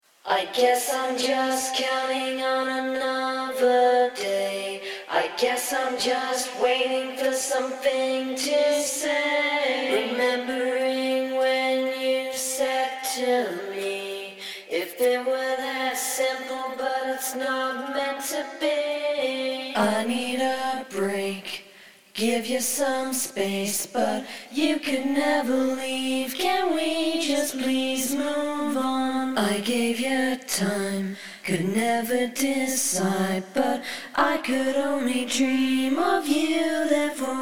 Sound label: Acapellas pop 100 C; Storm Commander